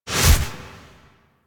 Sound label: Melee Damage Multiplier, Whooshes; Warframe; Weapons